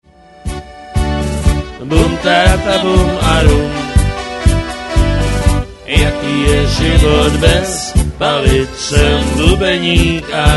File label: tango